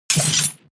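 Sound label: KATANA SWORD normal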